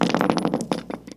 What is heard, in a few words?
Grenade Roll, Weapons Foley; SFX Kit